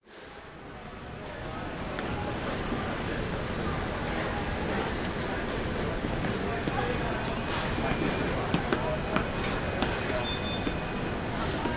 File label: O Hare Security Line